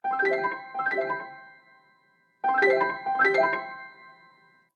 Flutterby, ringtones; Google Pixel

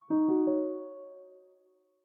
Beginning, notifications

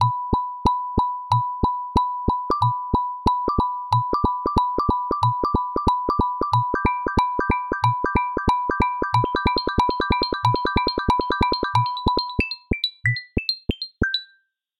ringtones

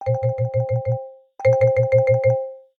Spokes, alarms

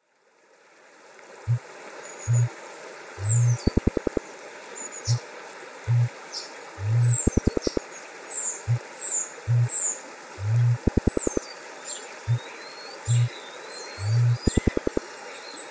Sunshower, alarms; lineage-20.0

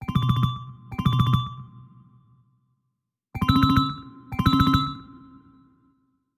Hotline, ringtones; lineage-20.0

